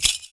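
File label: PERC, percussions; Univers Sons - Raricussions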